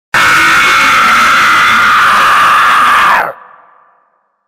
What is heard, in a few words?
scary scream sound effect horror scary